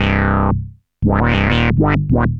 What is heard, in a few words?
ARP Odyssey, Arp A Line, synth samples